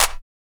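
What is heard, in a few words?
clap; E-Lab; X Static Goldmine 1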